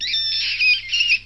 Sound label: moan; The Elder Scrolls III - Morrowind; rat